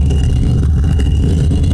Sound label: dung boulder